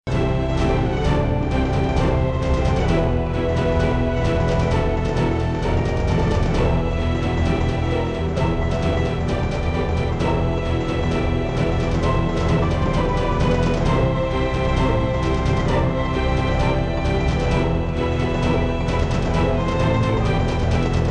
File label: cinematic, cinematic orchestra with epic drums; suno